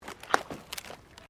JUNK, Debris; Material components for constructing more complex sounds; Materials

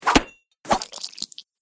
Sword Hits Shield Then Kills; Battle Sound Effects

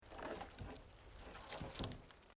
Wood Logs Movement Minor

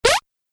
Arcade Game Old School Jump; Video Game Sound Effects; Retro Inspired Sound Effects - Think Nintendo, Atari, C64, Etc.; Andrew Fleming

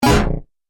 Game Fail Hit; Video Game

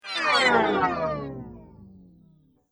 Multimedia Transition; Transition Sound Effects

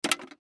Tongue Depressor, Tongue Depressor Throw Away; Doctor Nurse Physician; Hospital; Emergency

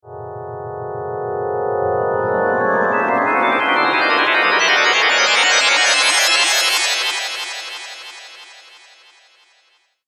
Haunted Chimes, Scary Tone Sound Effects; horror

